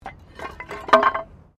Wood Metal Move; Material components for constructing more complex sounds; Materials

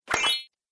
Pantomime Fairy Attack, Cartoon; sound design

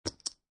Poker Chips Multiple Dropping On Felt

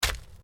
Organic stab; Various Organic Foley sounds; Stab And Slice; Combat

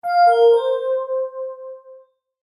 Multimedia Chime, Technology, Multimedia, Chime

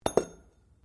METAL ON STONE IMPACT GENTLE

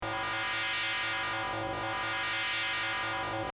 Scifi SFX Loop, Short; Stuart Duffield

